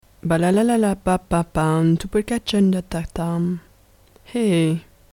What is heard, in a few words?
balalalala pahpahpah